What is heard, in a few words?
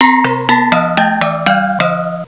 GONG PLAY